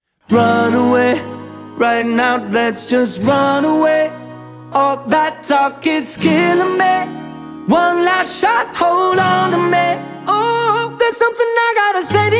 acoustic

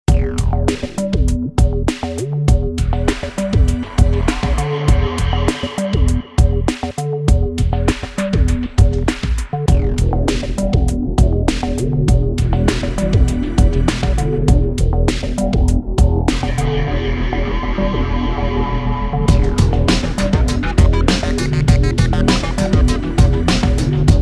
heavy metal interpretation

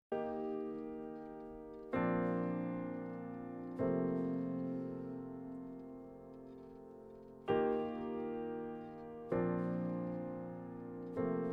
acoustic

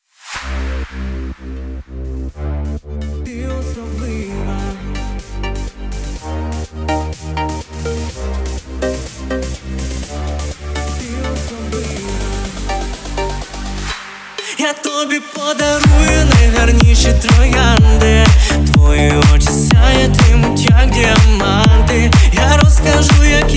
edm remix

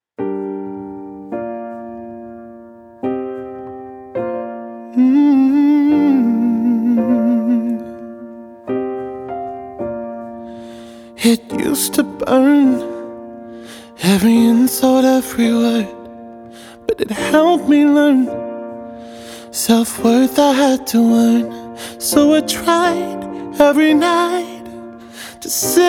acoustic

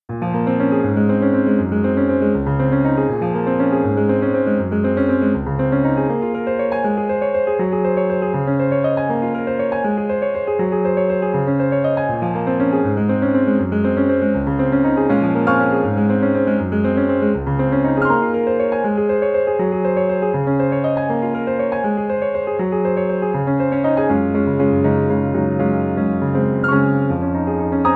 piano

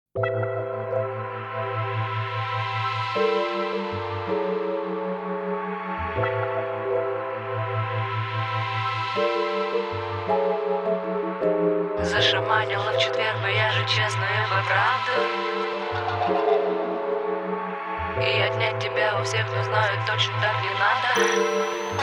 rumba

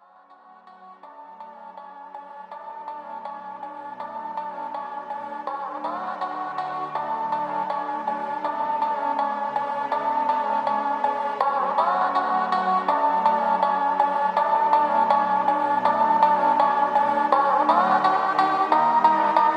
funk slowed reverb

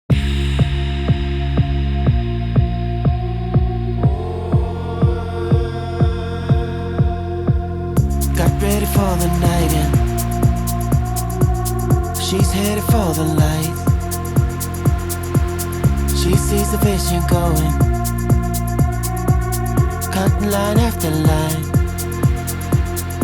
karaoke vocal version

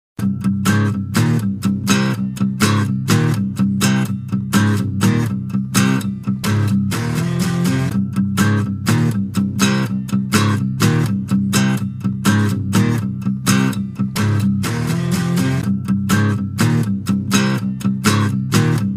instrumental

